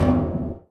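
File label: panels impact small, metal; physics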